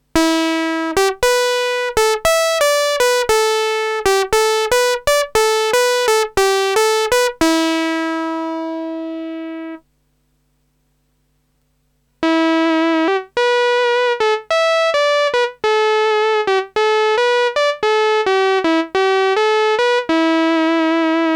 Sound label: Teisco S100P SITAR HAWAIAN GUITAR FUZZ GUITAR